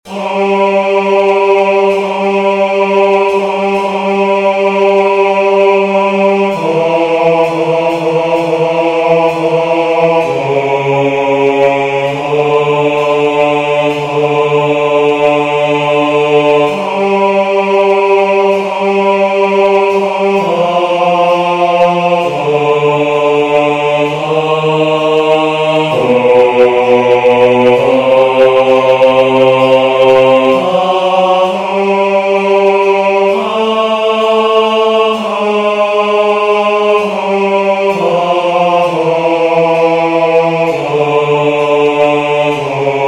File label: Magnificat bass